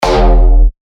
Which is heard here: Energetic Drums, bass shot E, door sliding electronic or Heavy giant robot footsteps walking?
bass shot E